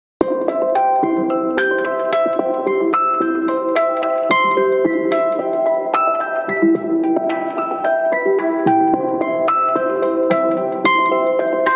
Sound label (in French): sunny day piano arpeges